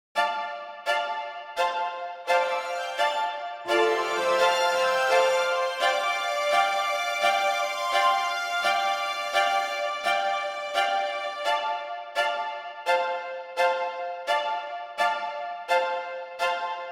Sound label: Strings; Sydney Opera House